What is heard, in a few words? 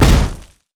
basic attack; LoL; Gragas